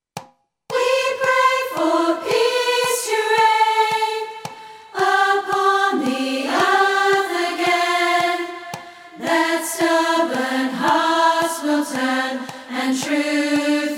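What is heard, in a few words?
Soprano chorus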